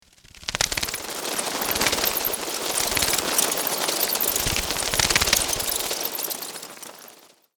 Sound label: Bat cave bats flying around